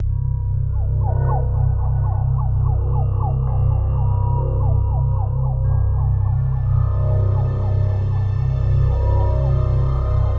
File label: sounds music; Halo Combat Evolved; Xbox